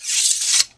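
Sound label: long blade away; The Elder Scrolls III - Morrowind; Morrowind GOTY GOG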